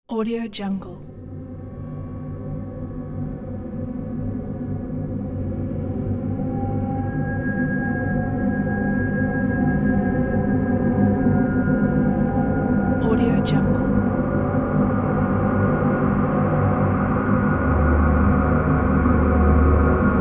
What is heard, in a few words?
epic texture sci fi